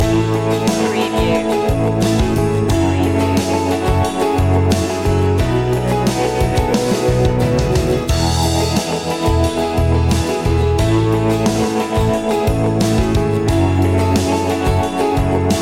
acoustic driving day

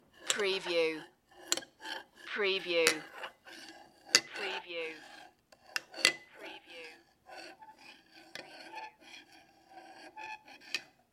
Bowl Stir Spoon

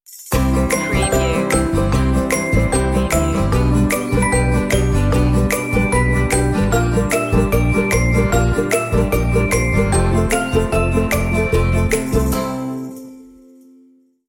stinger

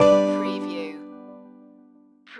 Multimedia Chime

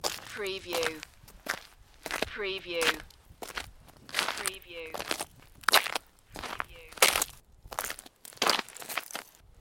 Snow and Ice, Footsteps ice

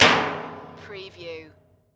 Hammer Hit Bodywork

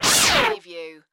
Distortion Blurp Sweeper Scrape Fast

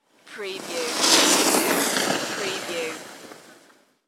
Ski By

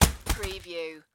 Paper Towel Package; Household Sounds / General Household; sound-effects-bible

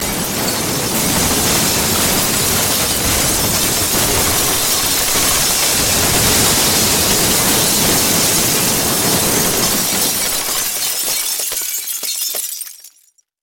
Crash Wood Glass Long

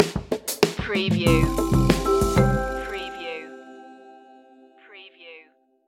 feel good island stinger

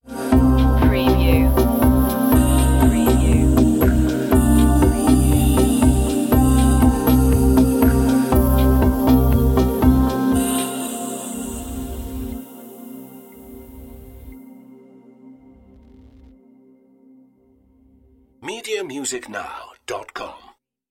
downtempo sunset

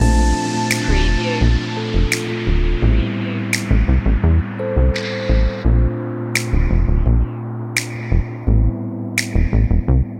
trap loop

